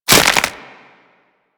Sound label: Stealth Neck Snap; Warframe; Characters; Tenno